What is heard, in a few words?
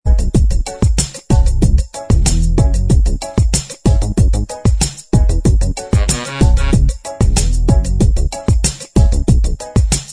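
instrumental, backing track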